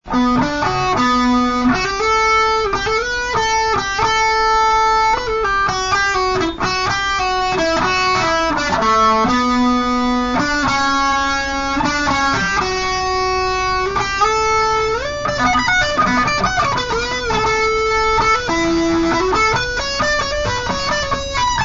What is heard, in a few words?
guvnor solo